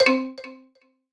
Puzzle Success Xylophone Two Note Decline Low Delay; stereo; Universal Sound FX